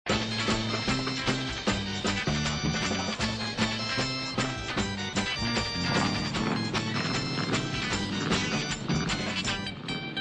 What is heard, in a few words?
music